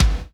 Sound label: BD, Drum & Bass, 160 BPM